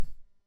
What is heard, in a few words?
card snap